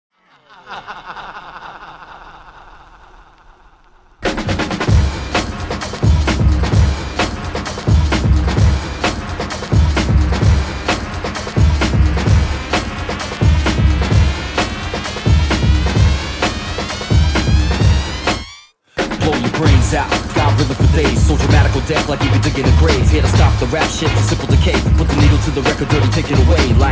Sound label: Tons Of Drums